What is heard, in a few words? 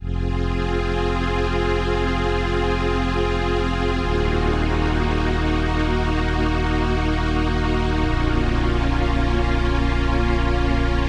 stringmachine like dry